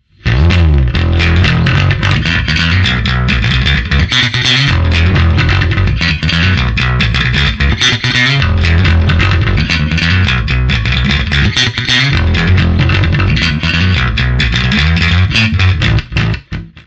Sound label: bass